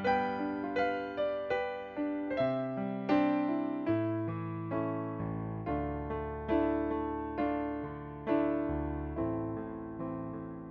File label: Piano